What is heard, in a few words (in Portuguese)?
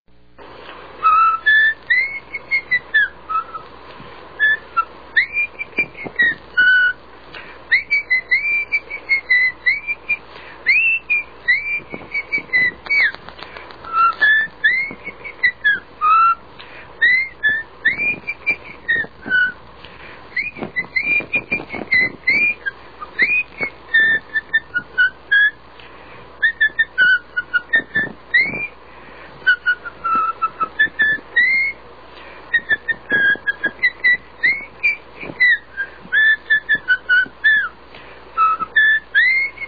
assobio sem musica